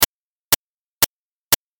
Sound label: noise short